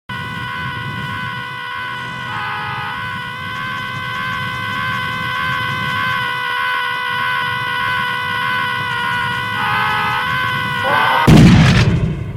aaaa

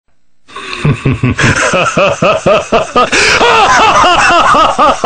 laugh

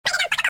alert; 4chan

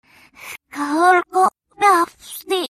clock, reverse

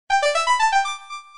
pickup; reversevape

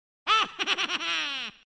wah

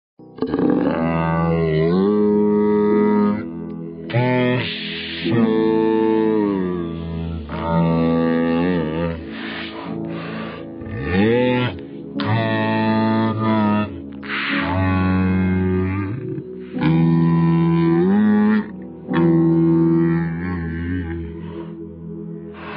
meme trumpet; vapesquared

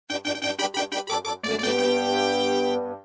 race fanfare; cave

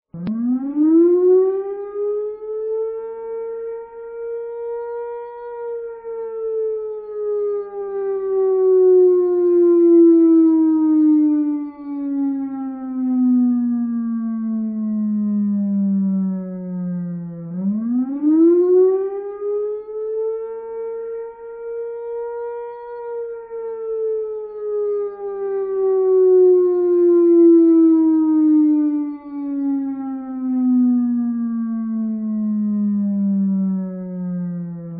police siren; vapesquared

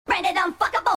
alert; 4chan

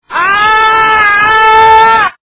fox ahh, reverse

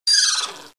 horse

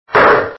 gavel

